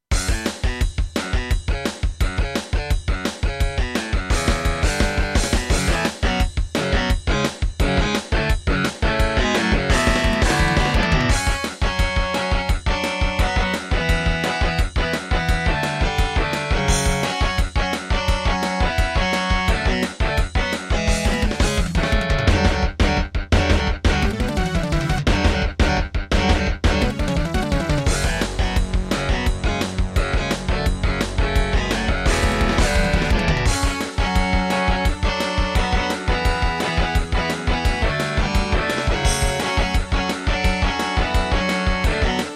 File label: Intro, Ensoniq VIVO 90DB-A; Duke Nukem 3D